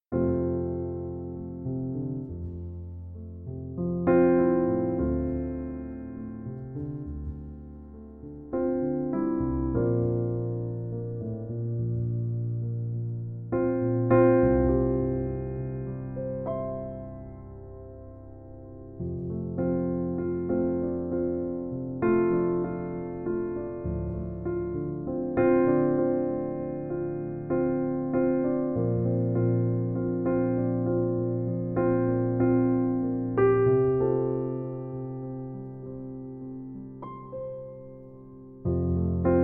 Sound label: Piano Version